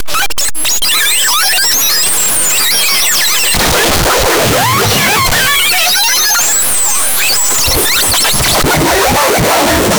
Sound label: long soothing, chime